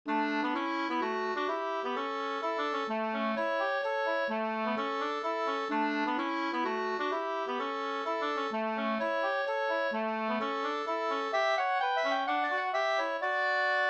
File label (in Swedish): polska, 2st